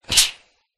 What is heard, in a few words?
household iron steam release punchy